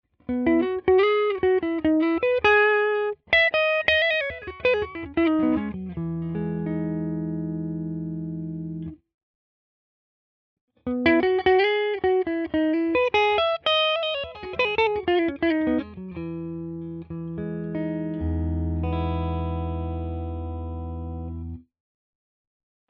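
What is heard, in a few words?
Clean Melody, guitar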